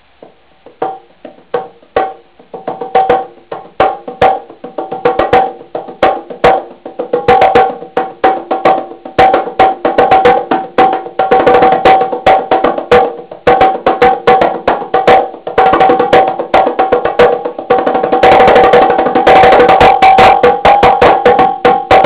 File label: darbuka